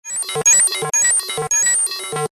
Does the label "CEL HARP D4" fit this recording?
No